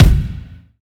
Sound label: clicky kick one shot E key; Duration 0:01; kick drum samples